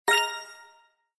BTN GOLD; Wealth Gods

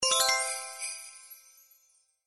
Win Higher Symbol; slots; Christmas Blast

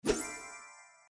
Flag Reveal, slots; Majestic India

